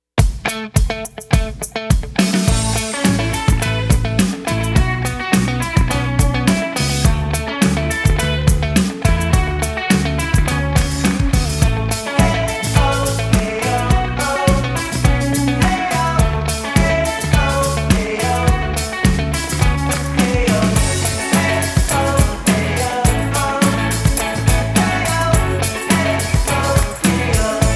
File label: INDIE POP